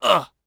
get hit voice; UFC Tapout

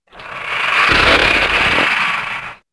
Charc Hiss, Dino

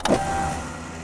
radar servo start; machines mechanical

